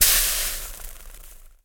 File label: Liquid Burnt; Puddle Plus